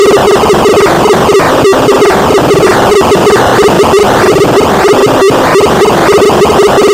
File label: nutz